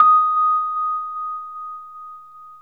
Rhodes, D#; 3D Sound; 3D Keys VOL-1

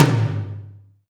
tom lo, Alesis DM5

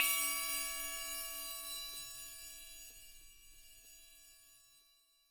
CNR CYMBL1, Ethno Techno; ILIO - Ethno Techno

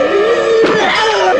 Dark Loop; FX

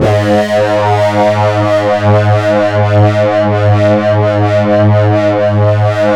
SYN LO RE; AMG - Now CD-ROM (Roland); Pure Gold

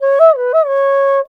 INDIA ALTO

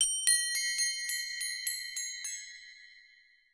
DESC, BELLS CHIMES; Voice Crystal - Steve Reid Definitive Percussion

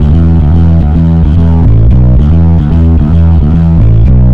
Bass, Distorted E; Bass X

